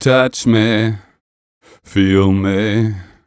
m 127 mel a, Vocals

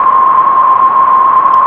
SONAR MOOG; Rubber Chicken Software Sample Bank

